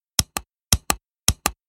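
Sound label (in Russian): нажатие на кнопки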